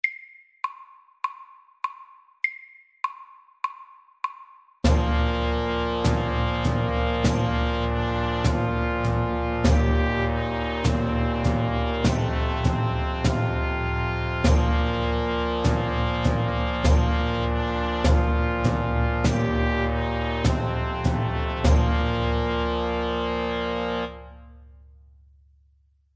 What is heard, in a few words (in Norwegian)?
engelsk melodi MEDIUM 100